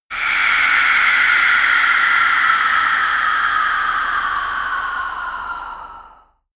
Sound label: air breath scream